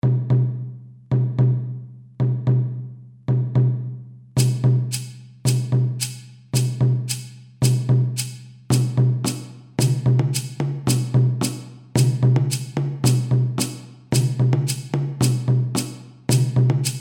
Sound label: drumcircle